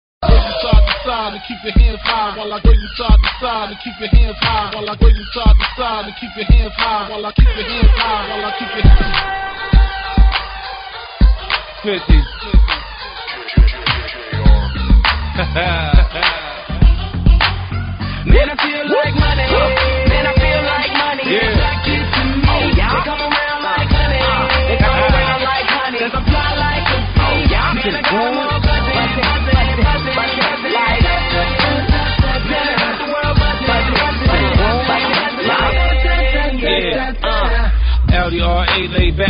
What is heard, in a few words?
funk hiphop